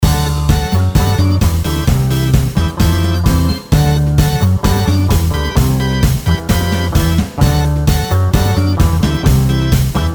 Organ

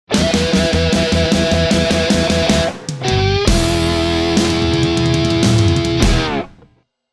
Electric Swamp Sting

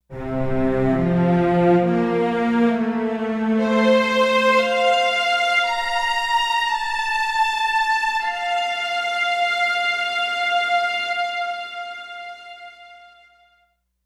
Hollywood strings; Omni

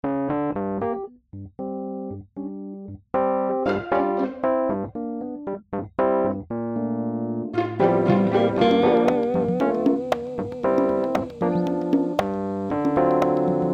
Funky

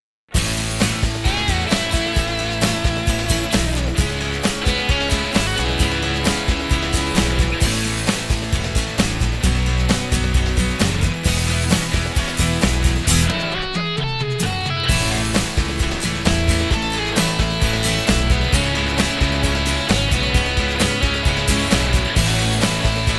rock guitar

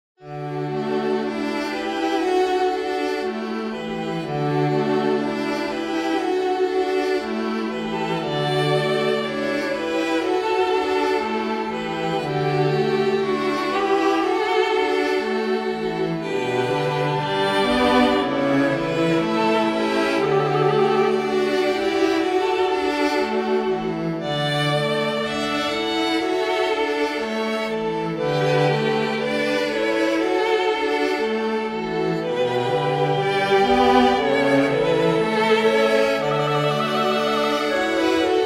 String Quartet

